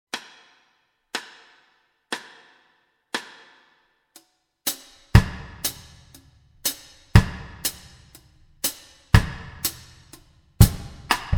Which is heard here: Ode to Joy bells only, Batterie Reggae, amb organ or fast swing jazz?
Batterie Reggae